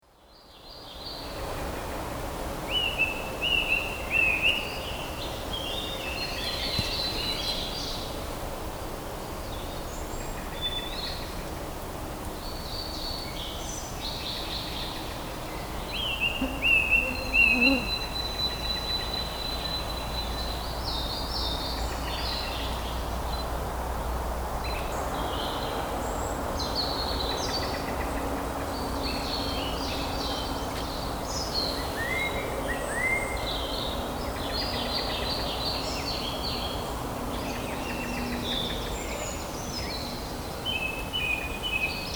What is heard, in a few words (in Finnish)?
vislaaja; linnut